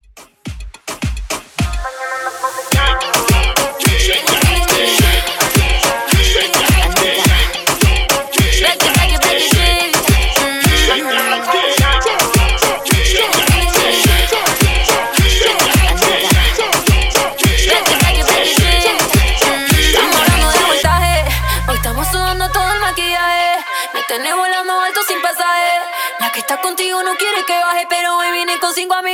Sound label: Moombahton Hype 106 BPM; remixplanet